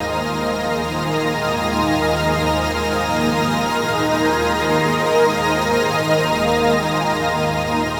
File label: Guitar Textures